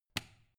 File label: switch turn on hard